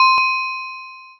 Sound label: tram bell